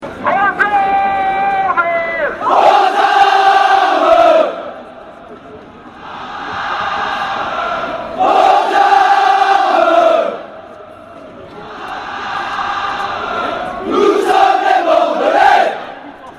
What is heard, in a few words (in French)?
Aux Armes, chants; Bordeaux